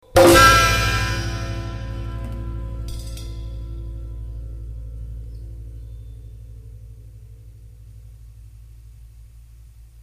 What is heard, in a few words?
chord; Ford Sterling; The Baddie; SOUNDTRACK FROM MELODRAMA